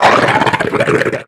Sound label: Attack